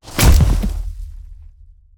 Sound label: step; bron pack